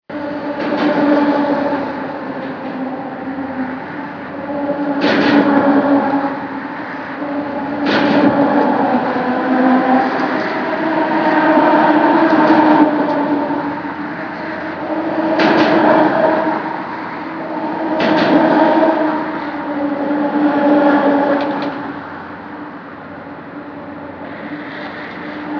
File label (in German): boston grundrauschen